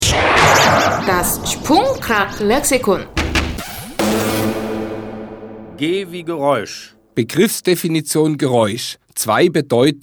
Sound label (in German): Geraeusch